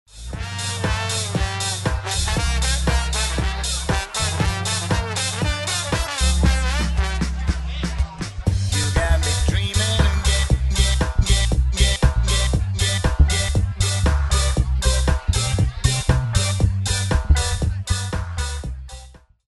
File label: ska mix